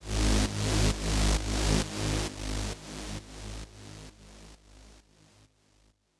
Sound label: Deep Pad Outro, 132BPM, G# major; Unlooped